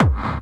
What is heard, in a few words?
Hard Kick